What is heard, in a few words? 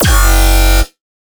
Bass Shot Metallic F#; Jino